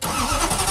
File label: start, koenigsegg agera; sgmcars